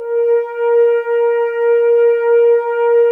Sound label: Brass F.Hrns A#; Miroslav Vitous - Woodwind Brass Ensemble